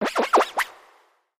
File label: monster